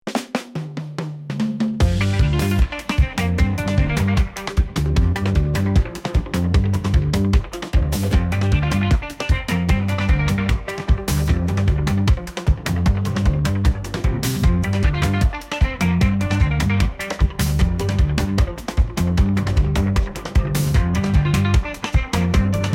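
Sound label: jungle rhythms, African; afrikanskaya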